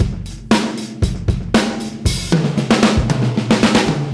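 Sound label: Beat Loops, 116 bpm; Zero-G; Monster Beats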